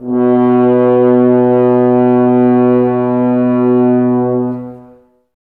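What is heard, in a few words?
Brass French Horns, Woodwind Brass Ensemble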